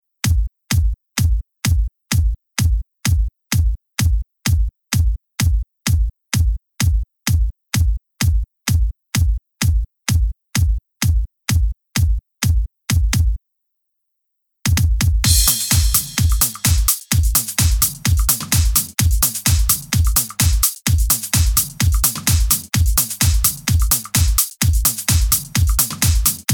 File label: only bass